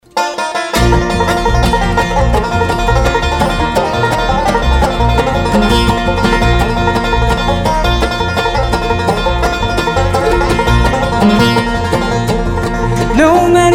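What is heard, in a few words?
banjo sound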